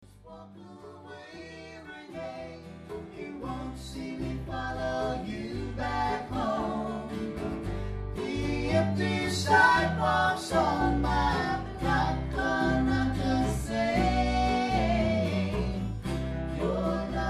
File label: classic rock band